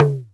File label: talking drum; drumtraks